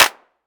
clap c sharp key; single hits one shots

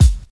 Raw Hip-Hop Kickdrum Sample C Key

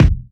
00s clean kick one shot d sharp; kick drum; D sharp key

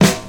short acoustic snare g key

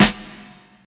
big room steel snare drum g sharp key

